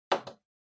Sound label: TV Hardware Button